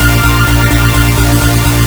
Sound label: VFX2 Loop 128 BPM